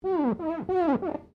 GLASS SQUEAK FINGER SEQUENCE; Material components for constructing more complex sounds

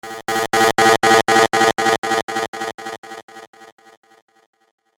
Red Alert Droid; Robot Sound Effects; science fiction sound effect; Andrew Fleming

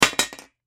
Bullet Shell; Bullet Shell 7mm Drop Metal Surface Bounce; Warfare; Blastwave

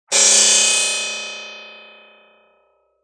Crash

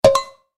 Chime Percussion; Web Interactive; Technology; Multimedia; Musical

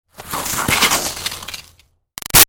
Snow Slide By Icy Scrape Bumpy; Skiing and Snowboarding; Sound Effects:Sports; Big Room Sound

